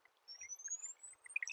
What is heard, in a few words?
Chirp